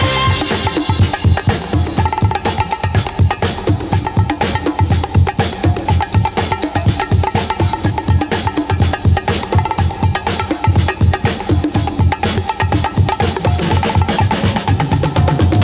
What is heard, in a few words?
Bongo Band